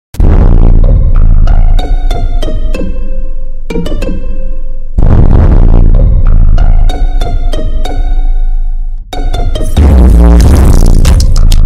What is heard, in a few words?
drip but its even even more bass boosted; among us; Voicemod